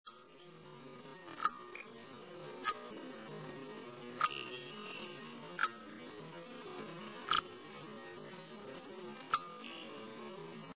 water driping, dungeon; angelsNdogs; dungeon1

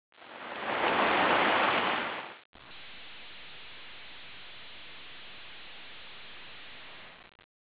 sea

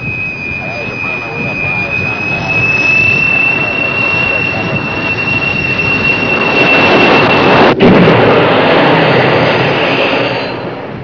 trap

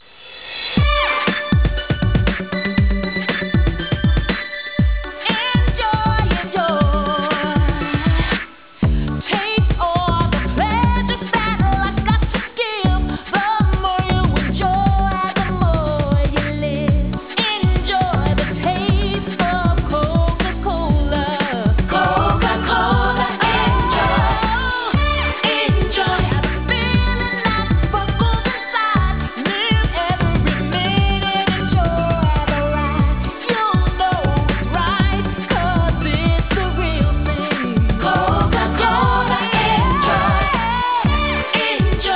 song

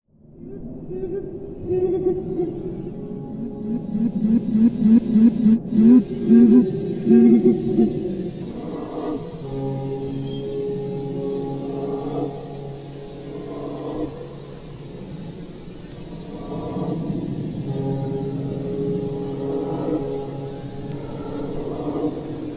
four doors; roomeyes; A tourist trap

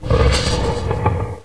Open ston; polymatrix; wing

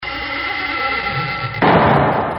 close

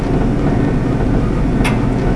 door

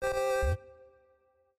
success; The Silicone Town

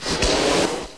Close

